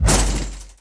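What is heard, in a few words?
metal rattle Hit medium; objects; Star Wars Obi-Wan; XBOX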